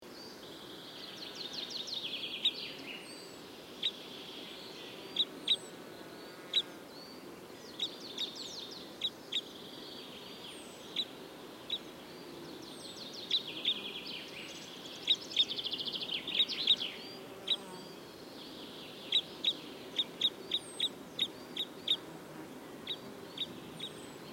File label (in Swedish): Mindre korsnabb